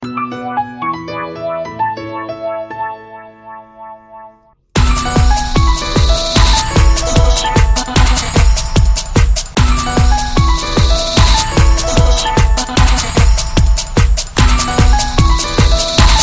latest remix nokia

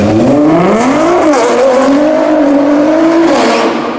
Nissan GTR

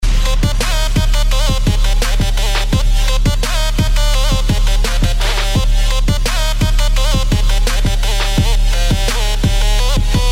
good arabic music, ringtone

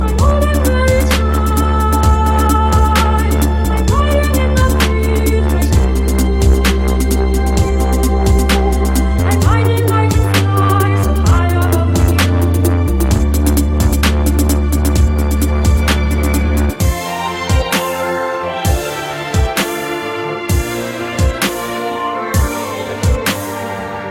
dubstep

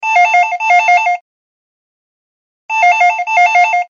Phone Ringtone

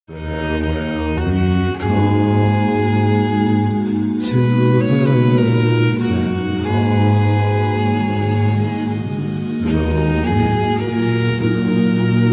violin a cappella; the hobbit

